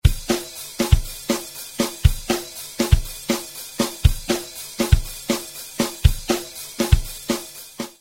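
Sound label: Double Time It's not twice as fast, but doubling the bass and snare make it sound like it is; rhythm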